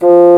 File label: Bssn; F2; Multisamples; Digital Sound Factory Virtuoso